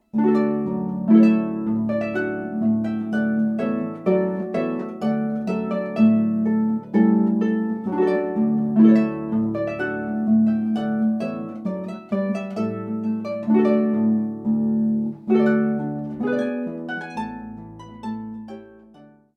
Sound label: TrumpetVoluntaryExit